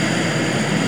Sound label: Missile; Worms3D